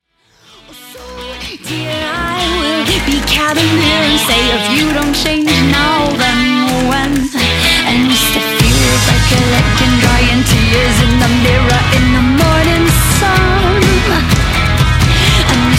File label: Noise Rock